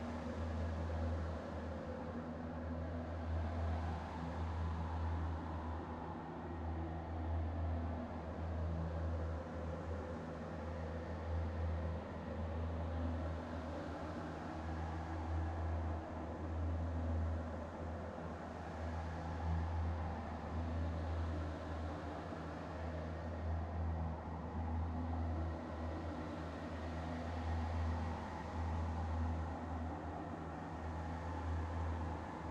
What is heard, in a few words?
Amb Wind Teleport-Static-On Loop